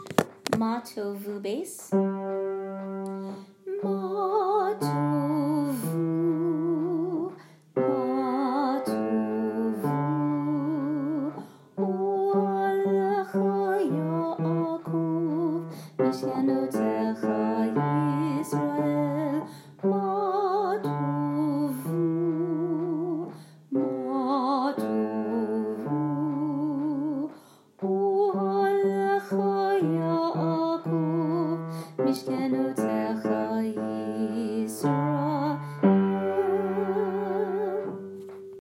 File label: Bass; High Holidays; Mah tovu 2019